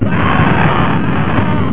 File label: Burning; traitor